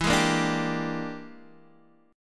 E 7th Sharp 9th Flat 5th Guitar Open D, chord

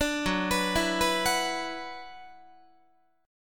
Gb 7th Suspended 4th Sharp 5th Gb Guitar; Gb7sus4#5 arpeggiated

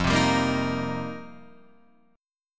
F 9th Suspended 4th F Guitar Drop D, chord

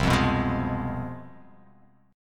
C Major 7th Suspended 4th Sharp 5th, down

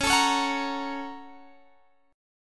A sharp Minor Major 7th Sharp 5th

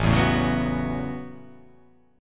A 6th Add 9th, A6add9 chord; strummed

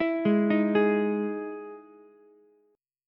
E Major 7th E down Ukulele; EM7 arpeggiated; JGuitar

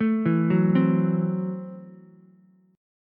Gb Minor 7th Flat 5th, Ukulele; arpeggiated; JGuitar

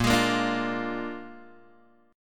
A sharp 7th A sharp, Guitar, Open G

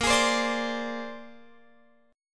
A sharp 9th A sharp Guitar Open F; strummed

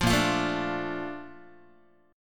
A sharp Major 7th, Guitar; A#M7; strummed; JGuitar

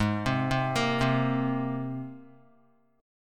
G sharp Major Add 9th G sharp, Guitar; G#add9 arpeggiated; JGuitar

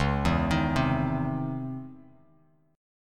C sharp Minor Major 9th, Bass Standard; C#mM9; arpeggiated; JGuitar

